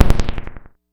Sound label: Cwejman S1 - Make Noise